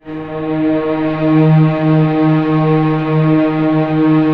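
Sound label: strings D#4 PP L; Sound On Sound - Peter Siedleczek's Orchestra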